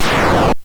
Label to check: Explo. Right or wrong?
Right